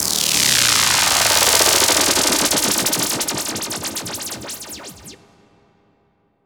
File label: Lazers